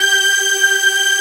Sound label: ORG G, ELIXIA ORGAN; Best Service ProSamples vol.10; House; AKAI